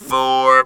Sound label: Talk Box high